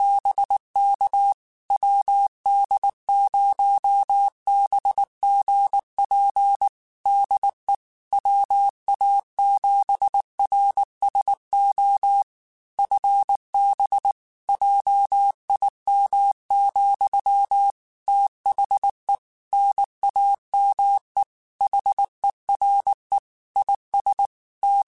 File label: Morse Code, QSOs